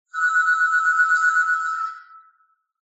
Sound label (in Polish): dzwonek